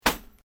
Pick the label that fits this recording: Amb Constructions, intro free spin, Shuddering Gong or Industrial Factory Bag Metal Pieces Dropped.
Industrial Factory Bag Metal Pieces Dropped